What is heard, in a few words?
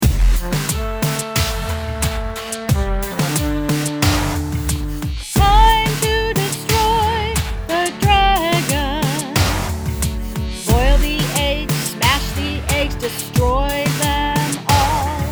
destroy eggs vocals